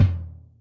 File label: BD ROOM, Yamaha DTXpress Acoustic Kick